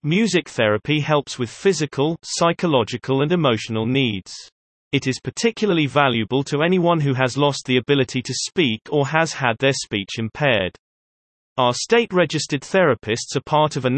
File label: music therapy